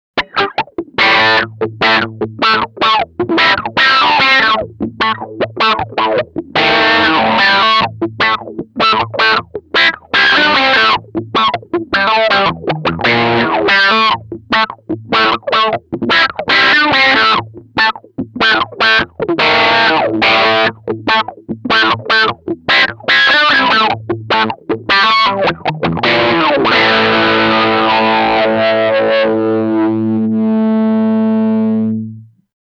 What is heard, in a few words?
Bridge Pickup LP